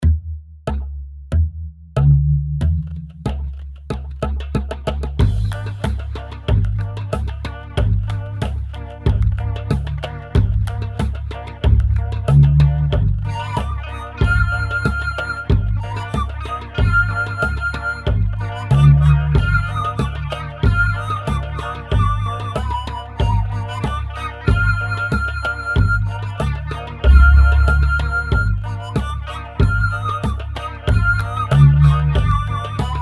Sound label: phaser